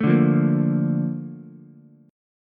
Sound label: A sharp Major 7th, Ukulele; strummed; JGuitar